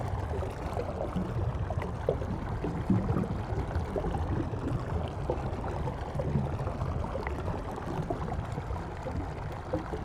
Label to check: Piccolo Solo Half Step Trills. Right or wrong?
Wrong